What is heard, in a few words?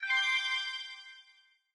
notification appear